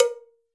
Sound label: Cowbell E; Latin Percussion; Drum Hits; Ableton; Factory Packs